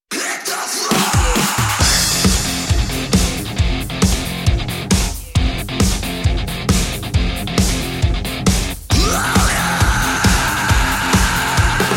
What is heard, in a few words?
metal no sfx, Rock Hard / Heavy, without the vocals